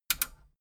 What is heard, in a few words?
metal button radio click enable fast